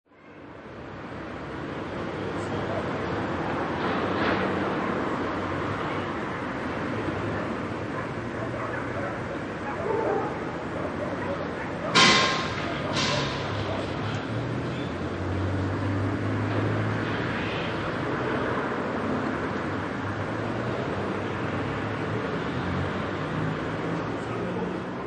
City Ambience At Night, Distant traffic, distant dog barking; clanking metal.